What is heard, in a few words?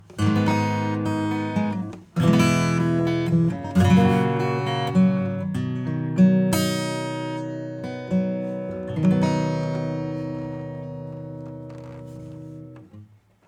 acoustic